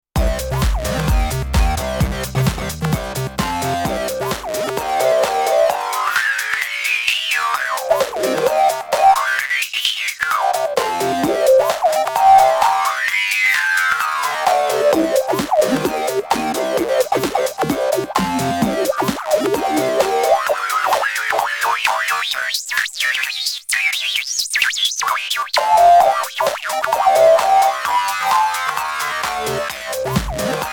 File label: Restyler Highpass